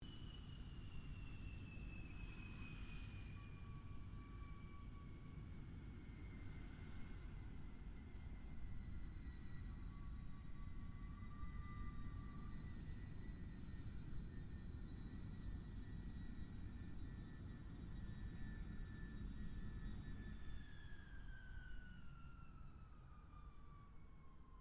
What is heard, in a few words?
AIRCRAFT GLOSTER METEOR F MK8 TAXI SHUTDOWN DISTANT; Gloster Meteor figter jet; Airplanes; Vehicles; Aircraft Collection; Twistedtracks